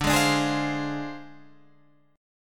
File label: D Minor 7th Flat 5th Guitar Half Step Down